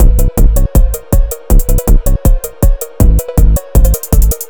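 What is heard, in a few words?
phonk loop; BakersAdventure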